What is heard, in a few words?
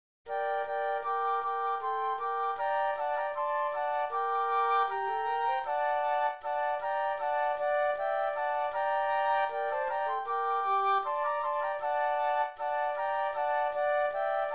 Flute Trio; christmas